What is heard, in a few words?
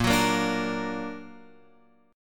A sharp Augmented, Guitar Open F; A#+ strummed; guitar chord; x,5,6,5,5,5